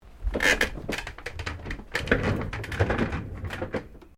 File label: Chair office wheeled move; Office furniture; Offices